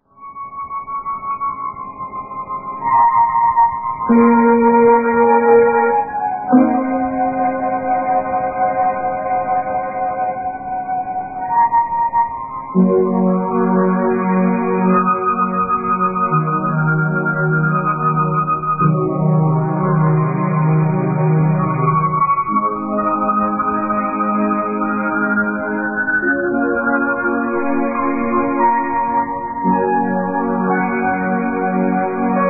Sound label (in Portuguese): teclado lento